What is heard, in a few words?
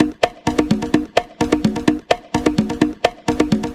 Percussive Loops